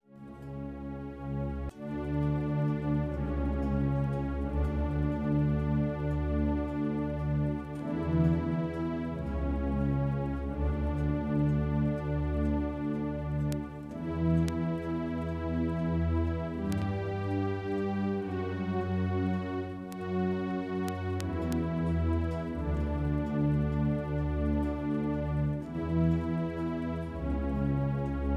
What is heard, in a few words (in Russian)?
etud v stile retro